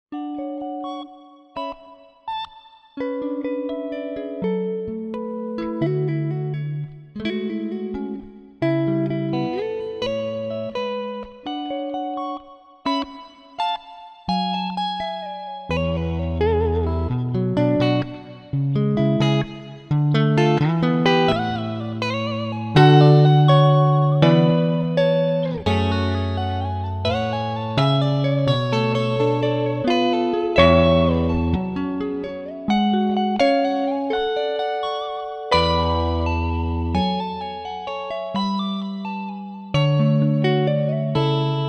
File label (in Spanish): Guitarra